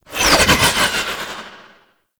Archgun Proj By; Warframe; Weapons; Archwing; Tn Nokko Archgun

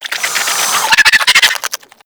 Infested Biped Warning; Warframe

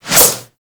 Boomerang Whoosh; Warframe; Weapons

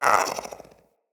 Pet Speak Short; Enemies; Catbrow; Pet Ship

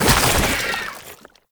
Underground Attack Tentacle Burrow; Warframe; Abilities

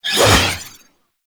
Sentient Sword Whoosh; Warframe; Stalker

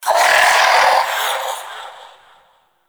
Shadow Vocal Death; Warframe; Duviri

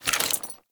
Generic Foley; Warframe; Dagath

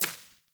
Sparrow Step, Sparrow Footsteps

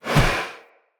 Husk Whoosh Heavy, Unarmed; Weapons; Enemy; Mockery Husk

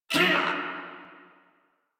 Pain Crewmen; Dialog; Barks; Battle; Warframe; Corpus Crewmen; Crewman D

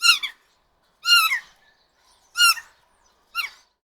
Forest Bird Night Specific; Warframe; Grineer Forest

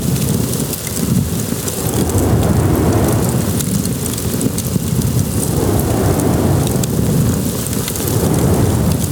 Ballas Eye Glow Warning Loop; Warframe; Chimera Prologue

